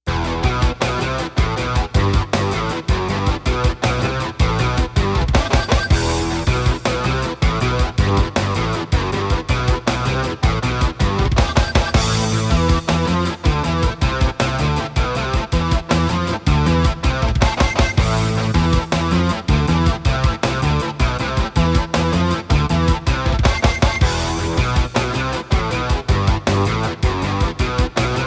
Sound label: Roland SC-8850; Doom Revisited